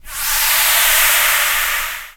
noise, production effects

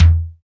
DRM FLOP, Brushes; Roland L-CDX-01

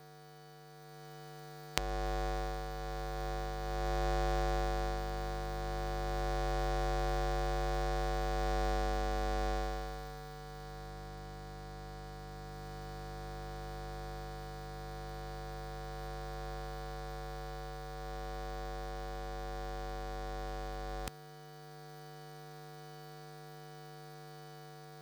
Electromagnetic Field, Electro; electric fields

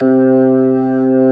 B3 C 2, ORGAN

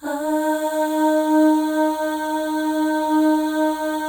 AHS, Symphony of Voices, FEM

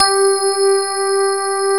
GRAND PF G 3, AKAI S-Series

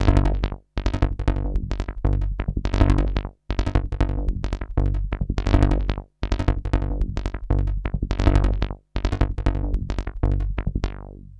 REZO TB, BASSLINES; Masterbits - Tekkno Trax

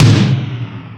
More Toms, Drum Machines

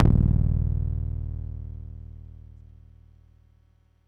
PAFMBass d#1, Yamaha YX81Z

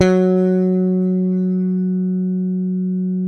SPC SUS F F#3, Bass; Sampleheads; Will Lee Bass Library

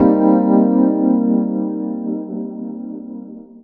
Rhodes Chord; Jungle; Keys; Zero-G Jungle Warfare 1, 2 & 3; 90 s Sample CDs

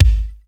80s Kick; Drums

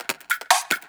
LOOP EQ, Drum & Bass, 150 BPM; Ueberschall; Drum & Bass Resonance

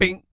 BASS FX; ILIO; Vocal Planet VOL-3; Jazz & FX

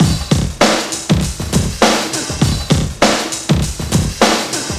slapback, 100bpm

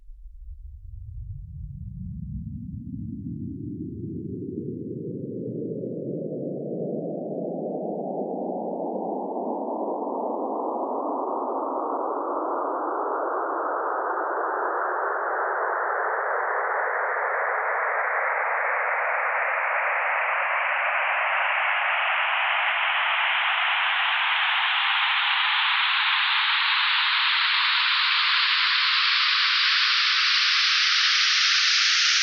Sweeps Up, Effects

